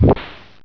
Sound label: Buddy Off; SonicTheHedgehog